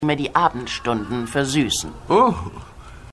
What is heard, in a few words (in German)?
Schrei